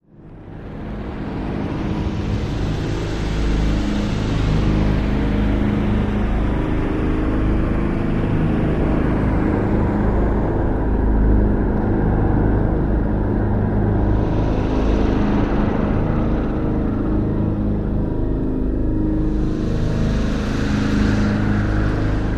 Saturn Return-Ambient movement with distorted whispers; granular